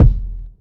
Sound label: Boom-Bap Kick